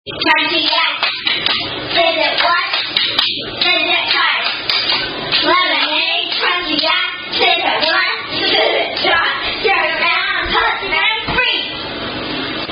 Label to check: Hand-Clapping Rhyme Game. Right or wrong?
Right